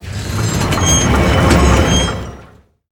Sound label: Shooter Trap; moul